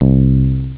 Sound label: CASIO BASS; jazz jackrabbit 1; M8